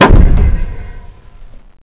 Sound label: button